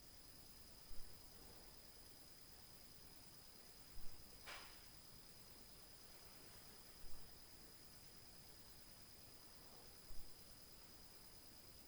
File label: TAK NOISE In Main Room, Night Noise